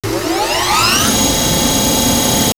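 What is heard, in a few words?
Start Up, electronic fx; X-Static Goldmine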